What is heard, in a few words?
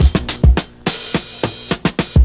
FILLS, 70_s Breakbeats